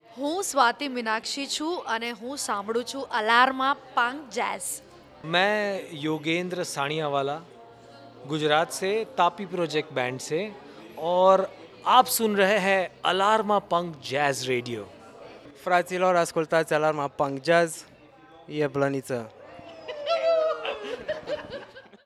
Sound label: JINGLE